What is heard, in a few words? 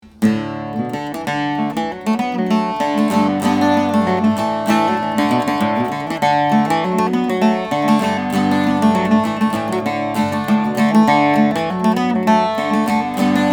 Condino Octave Mandolin; brazilian rosewood adirondack spruce